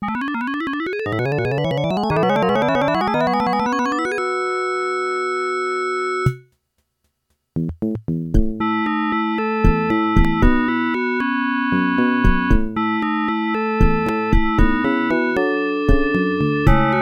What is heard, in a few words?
intro, sb 16 ct2740 adlib; soundcard test; spellcasting101; Soundcard test page